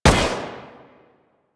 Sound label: Rifle Shot; Bard Prime